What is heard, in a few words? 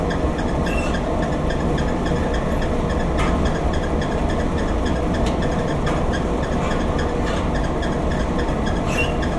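ambient hvac vent loop; ambient air; Half Life Alyx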